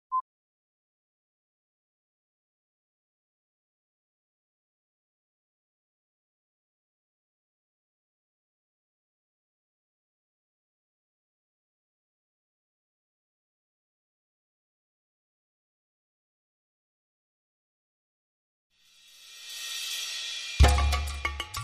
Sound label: Ocean Sailing, Percussion